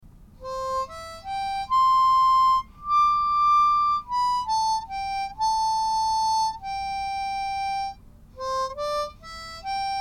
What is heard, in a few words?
harmonica; morning has broken